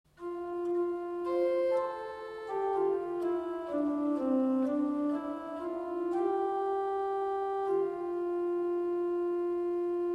HW Principal 8

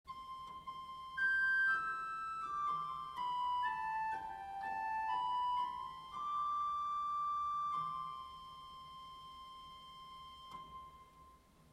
Quinta 3

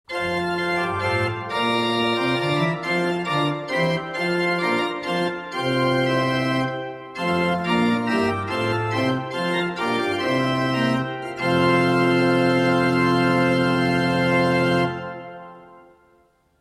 RP Prinzipalplenum

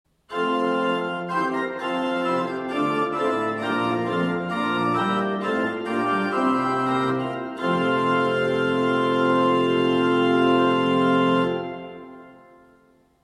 Prinzipalplenum

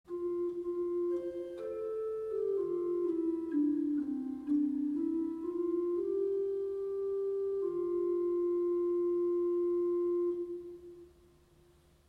HW Gedackt 8

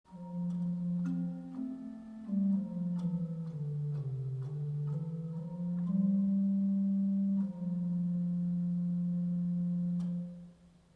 Bordun 16

